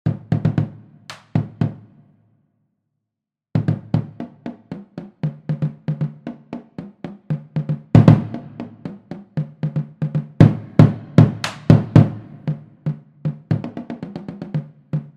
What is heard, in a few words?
cadence bass Drums